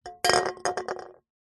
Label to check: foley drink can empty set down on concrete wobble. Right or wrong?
Right